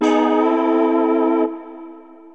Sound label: Air Chorus bend